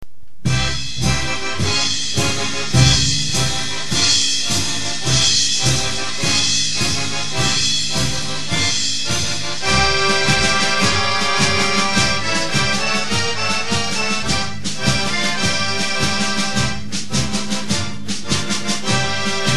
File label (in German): Tunesische Impressionen